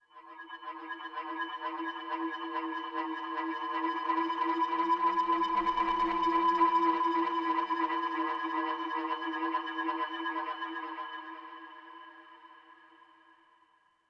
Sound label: Overlay Dark